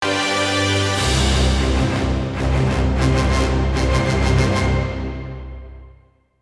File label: Final Stinger